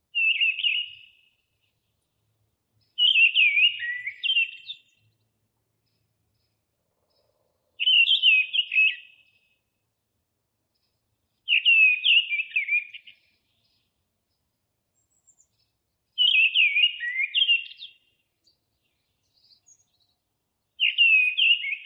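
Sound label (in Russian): deryaba golos, mistle thrush voice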